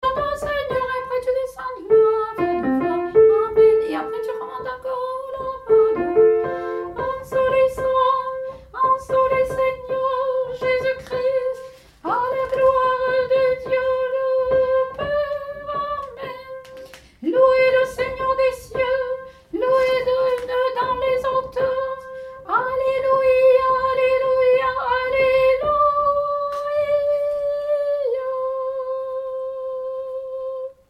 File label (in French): Un seul est saint Tenor